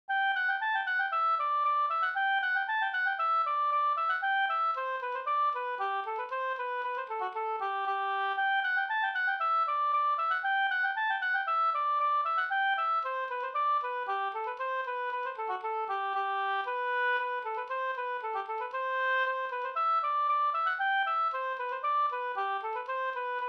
slangpolska

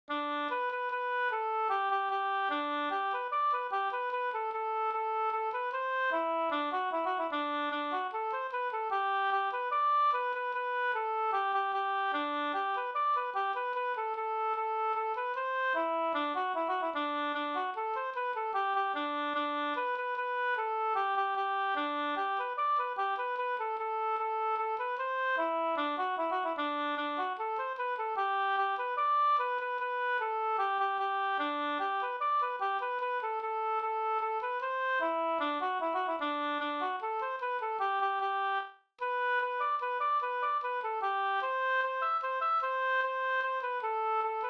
mazurka; Sprattmazurka fr Gardhem Vg

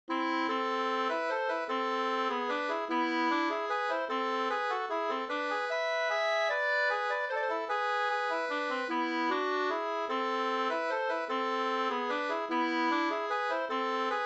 vals; August Stromberg

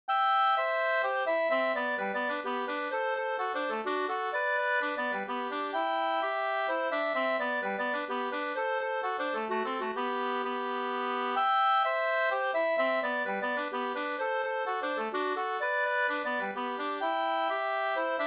polska Undersaker

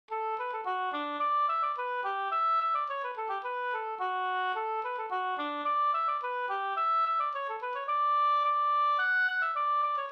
skanklat

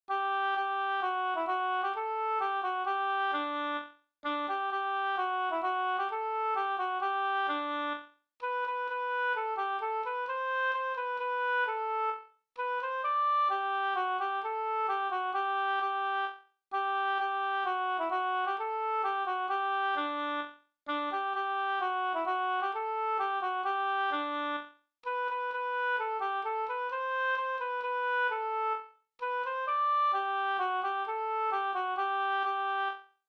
vismelodi Sanglek

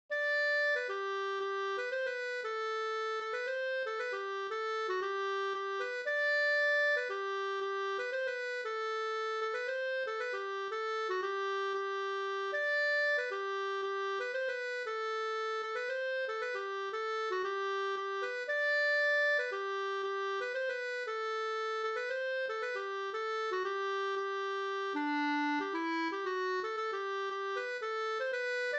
polska Bodapolska

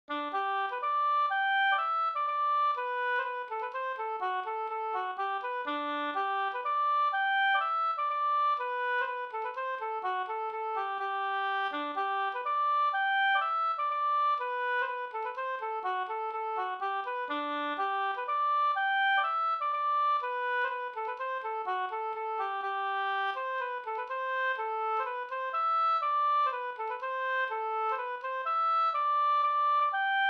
hamburska

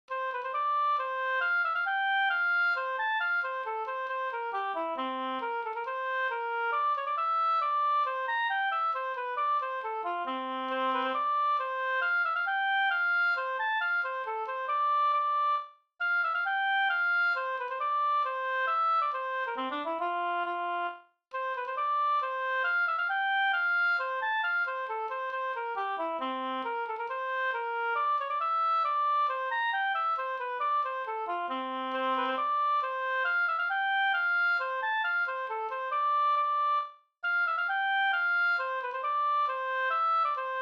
hambopolska

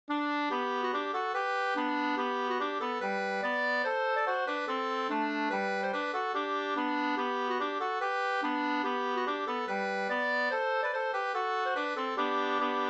schottis; Karta-schottis 2st

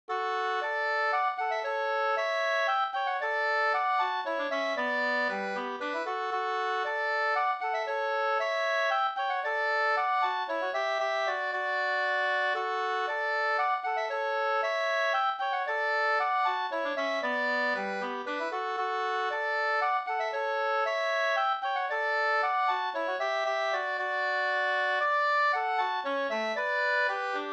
slangpolska 2st

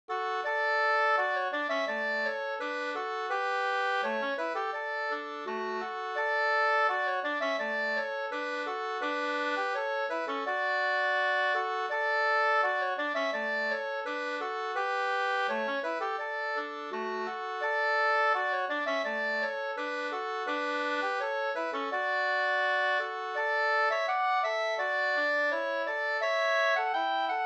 brudmarsch Ridmarsch

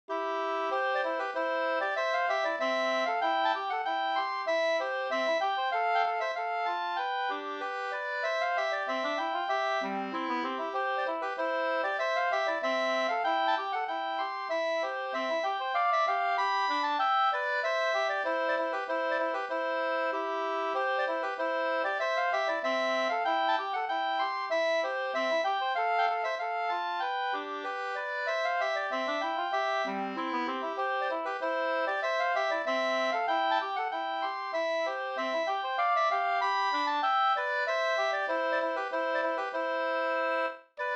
brudmarsch; Vingakers brudmarsch e Carl Erik i Lind

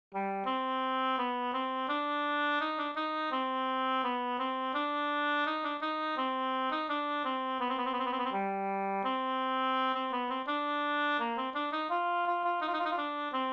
ganglat C moll; Peltoniemen Hintriikan surumarssi fi

